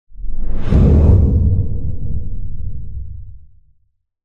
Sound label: impact underwater or space massive